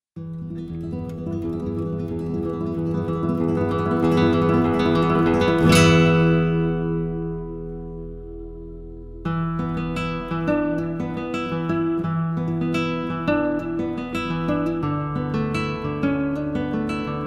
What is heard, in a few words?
Amazing Chill Guitar; CHILL OUT SUAVE